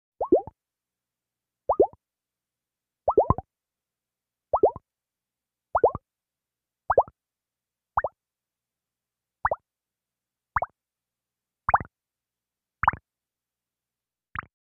Bubbles Ascending

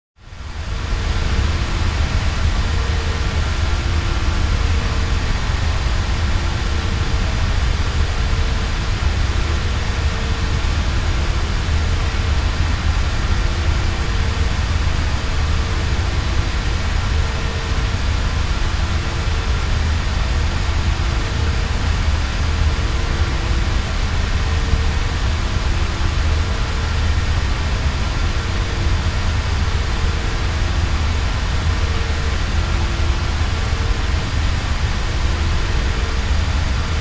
Space Ship Eng Rm Amb; spaceship fx

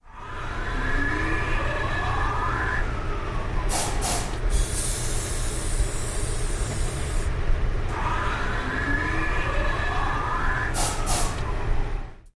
DRONE MANUFACTURING, factory

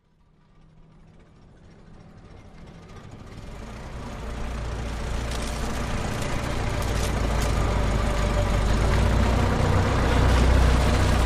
Model T By In By Low, ford model t

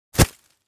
Foliage Impact Object Dropped on Ground; hits & impacts

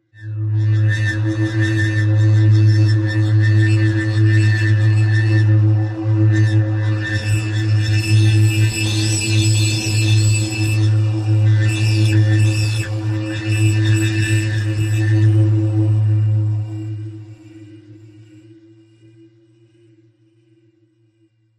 Cold Chamber Machine M, metallic

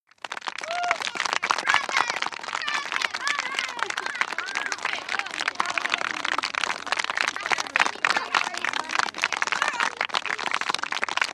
Crowd Clapping Large, cheer

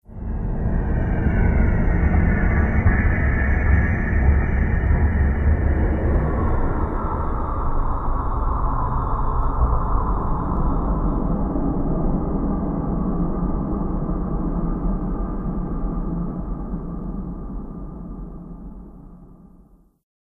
Long Deep Stinger, whooshes & swishes

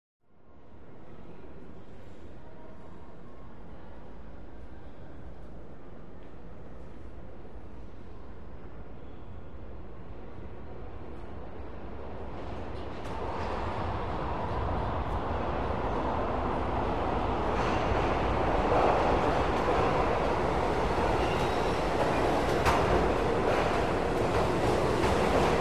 Subway Plat Train In, subway

